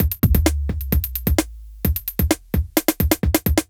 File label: fast break 130